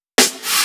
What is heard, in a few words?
Fill 128 BPM; Vengeance Samples; VEH1 Loops